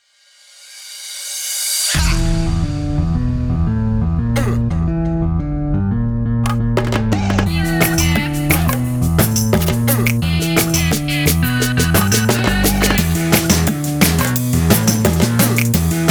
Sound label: INSTRUMENTAL